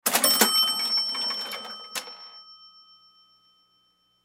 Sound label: Cash Register, Cash Register Drawer Open; Office; Cash Registers; Blastwave